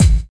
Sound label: short kick single hit a key; kick drum samples